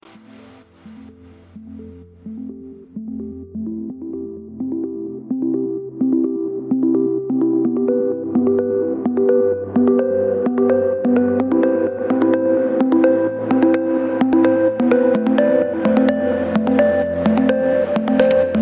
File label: Euphoric melody